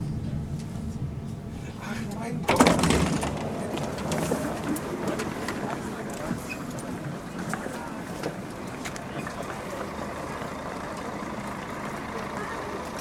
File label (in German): luisenplatz; olympus ls-5